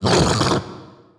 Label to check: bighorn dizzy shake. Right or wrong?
Right